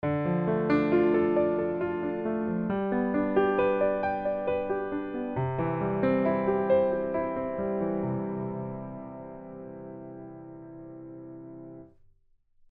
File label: arpeggio